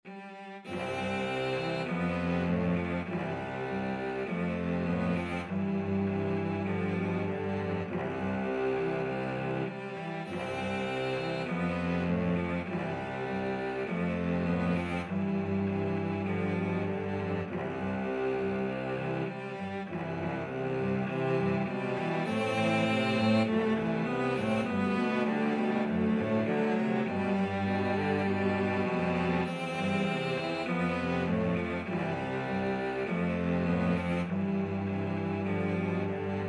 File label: blue bells scotland, cello trio